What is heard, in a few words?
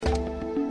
Spin Stop; Aztec Fate